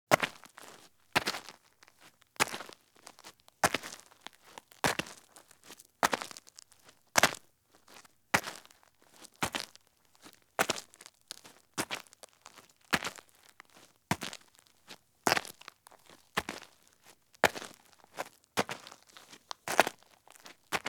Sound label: Women's High Heel Shoes Walk at Slow Pace on Roadside or Driveway Gravel; Sound Ideas; Footstep Sound Effects